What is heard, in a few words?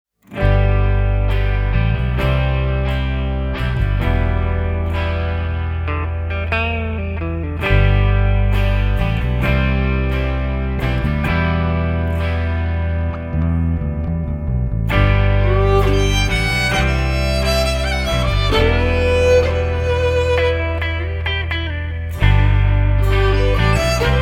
Violins and Hammers